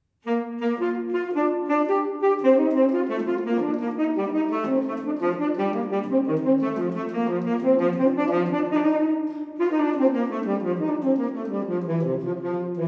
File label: Fugue In G Major